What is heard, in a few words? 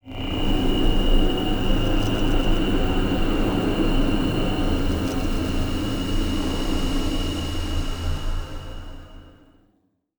electric fence; rifts; Stellaris; astral planes